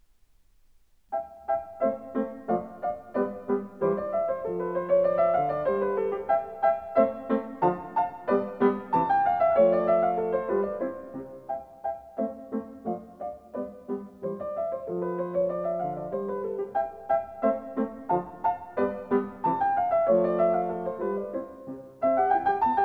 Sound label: Piano Sonata in C, Rondo. Allegretto; Mozart; Piano Sonata No．16; K．545 ＂Sonata semplice＂